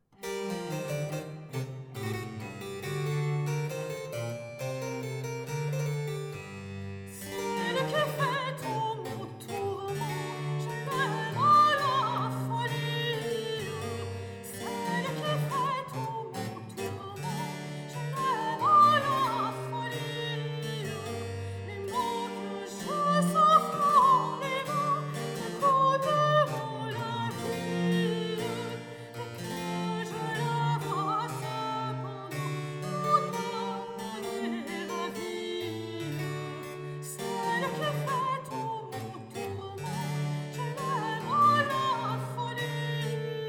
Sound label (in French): baroque couplet soprano; airs sérieux et à boire